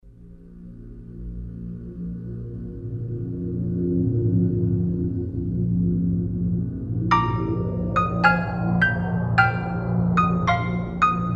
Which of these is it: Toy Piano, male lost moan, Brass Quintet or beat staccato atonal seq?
Toy Piano